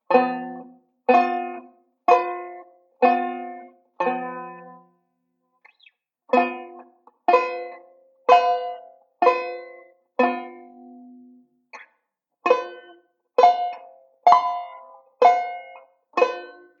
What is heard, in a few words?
G7 Tritone Drill, banjo; Beyond Chord Melody Volume II